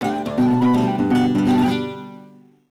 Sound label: Action Jingle